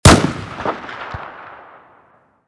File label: mosina fire; STALZONE